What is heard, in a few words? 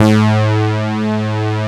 MEMORY M, USA SYNTH; East West - Global Sounds